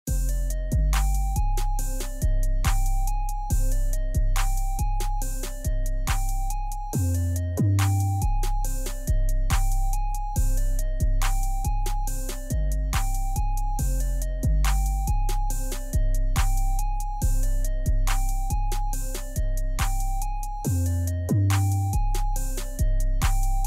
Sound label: Instrumental